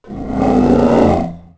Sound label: scream; fab Hulk